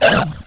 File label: pit drone communicate; svencoop